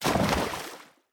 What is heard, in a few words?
water land